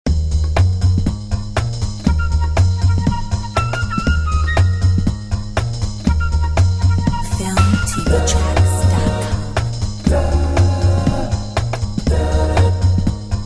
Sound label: Modern Jazz